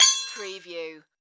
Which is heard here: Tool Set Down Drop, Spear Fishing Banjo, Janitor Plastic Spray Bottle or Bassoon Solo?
Tool Set Down Drop